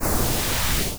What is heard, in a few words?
transition